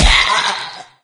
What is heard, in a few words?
pain left arm; doom3